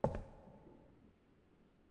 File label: Footstep Wood